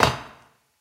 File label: metal land; Golden Crayon Sounds